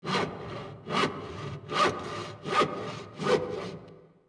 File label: HAND SAW, construction